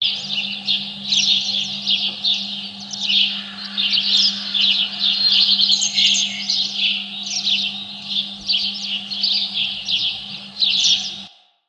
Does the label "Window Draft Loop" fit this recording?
No